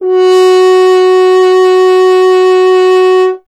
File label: F. Horn; Miroslav Vitous; Solo Instruments VOL-2